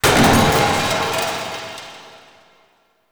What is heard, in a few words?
Accelerant Drop; Warframe; Nokko Colony; Visions